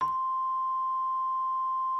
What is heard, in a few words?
Instrument